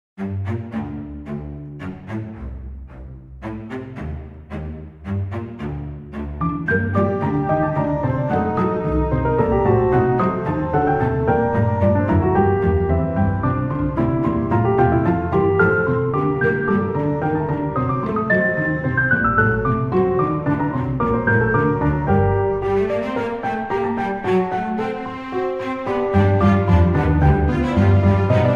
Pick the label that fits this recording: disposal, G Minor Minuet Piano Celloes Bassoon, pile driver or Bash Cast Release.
G Minor Minuet Piano Celloes Bassoon